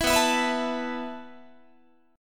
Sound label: B 7th Suspended 4th B down Guitar